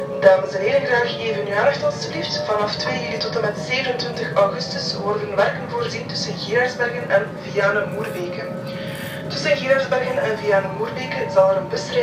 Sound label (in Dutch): tunnel